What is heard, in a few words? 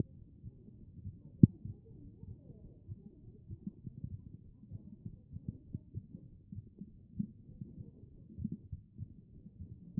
HEART B MODE